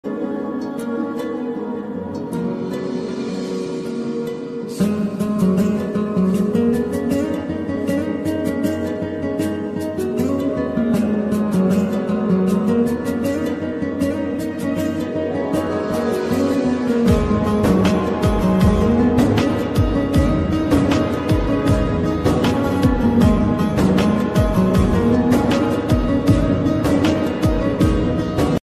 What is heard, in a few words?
bangla romantic ringtone bangla love ringtone mobile phone ringtone